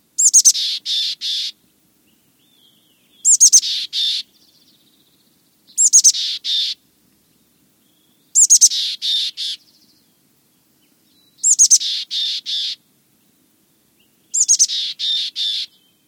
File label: mountain chickadee